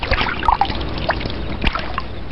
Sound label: water stream, ambient